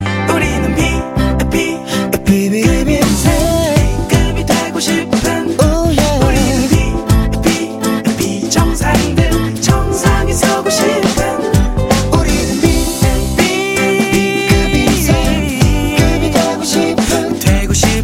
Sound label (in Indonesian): Nada Dering; Dream High 2